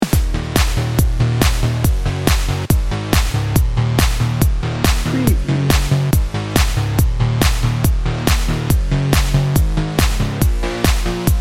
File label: dancing divas dnb